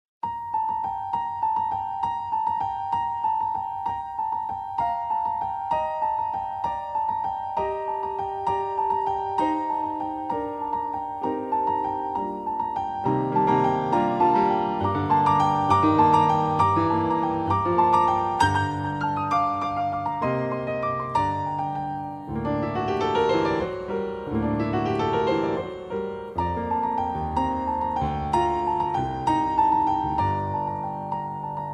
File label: carol of the bells piano; hoa tau